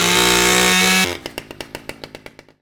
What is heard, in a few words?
Chainsaw; Angry Goy II; SoundEffects; Varg